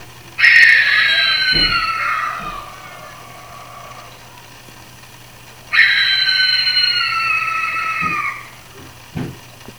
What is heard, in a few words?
Barn Owl